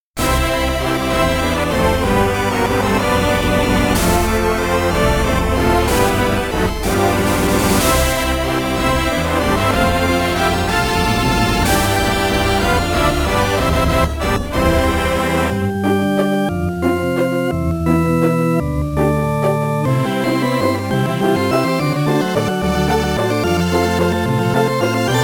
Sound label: Magical Waltz; atelier lise alchemist of o ldor original sound track premium pack